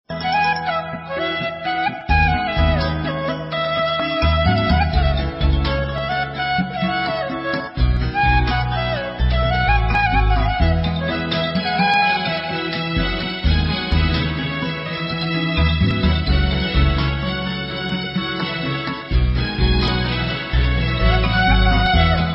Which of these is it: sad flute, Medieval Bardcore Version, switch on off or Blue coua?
sad flute